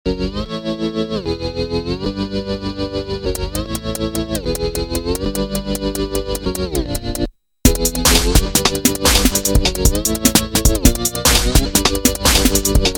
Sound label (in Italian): organo